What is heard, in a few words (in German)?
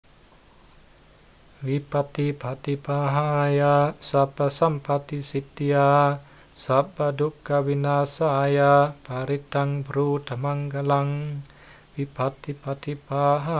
Bitte um Paritta Chanting